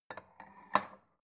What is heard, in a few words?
bowl shelve soft